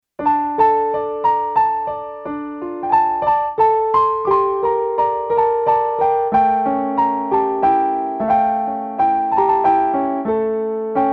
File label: piano